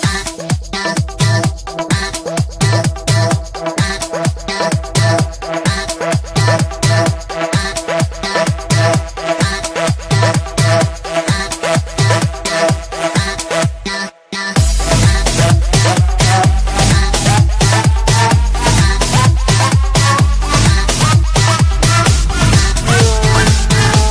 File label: tech mix; minion